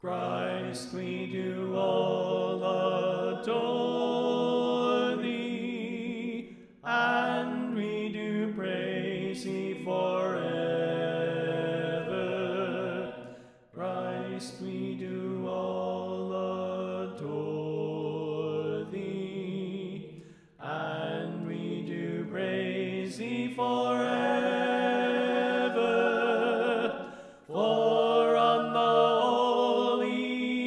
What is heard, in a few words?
Tenor Left